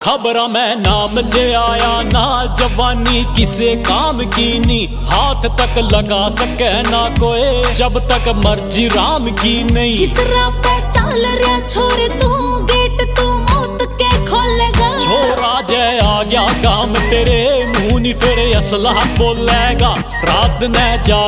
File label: Ringtone